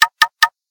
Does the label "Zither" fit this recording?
No